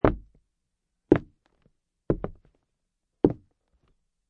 Footsteps Walk Wood, 4 footsteps by person walking slowly on wooden fl; wood surface; Lynne Music